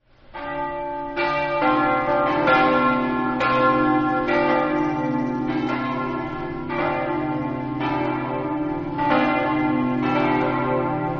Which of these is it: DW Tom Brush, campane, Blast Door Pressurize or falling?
campane